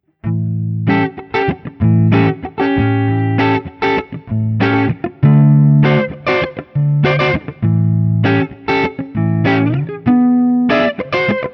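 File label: Guild S300AD ODS100 7th, guitar; sunburst Guild S300AD guitar; 7th Chords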